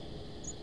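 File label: American Redstart, nocturnal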